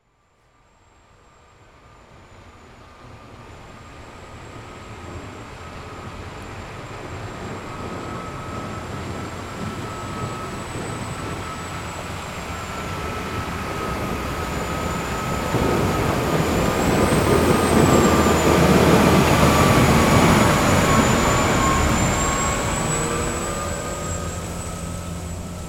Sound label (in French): vaisseau special